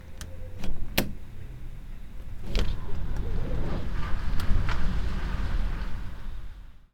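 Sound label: wind and window open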